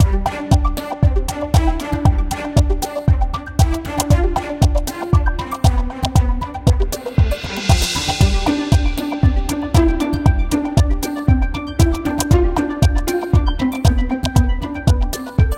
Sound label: Table Steaks (Win); Cooking Dash